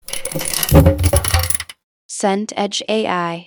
stairs creak wood

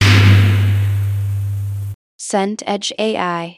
room tone stairwell

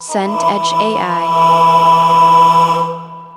choir pad sustained

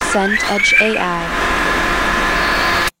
forklift beep move

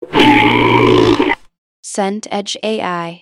pig grunt

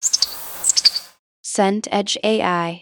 bird chirp single

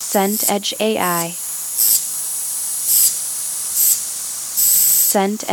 insect swarm distant

